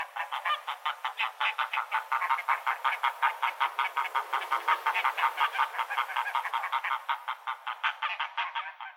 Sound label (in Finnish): merihanhi